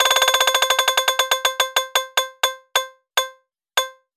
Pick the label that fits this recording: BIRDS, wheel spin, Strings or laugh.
wheel spin